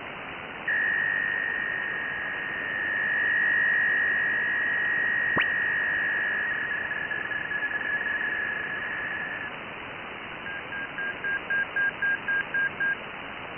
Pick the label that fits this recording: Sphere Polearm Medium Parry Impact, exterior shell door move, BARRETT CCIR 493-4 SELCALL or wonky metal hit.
BARRETT CCIR 493-4 SELCALL